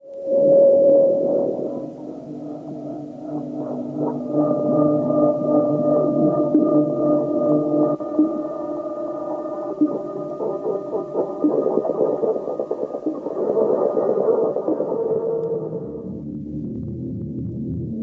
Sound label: jingle